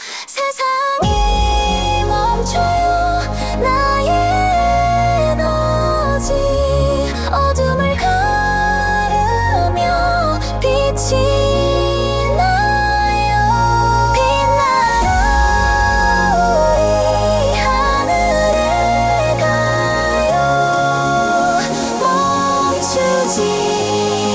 hyper edm M; acestep

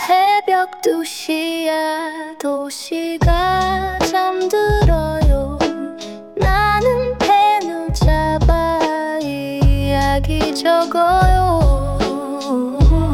boom bap F; acestep

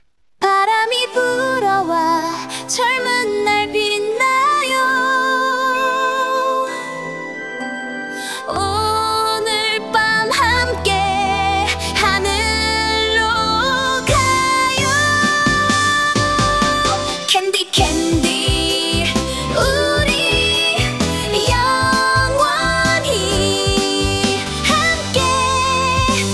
late90s dance M; acestep

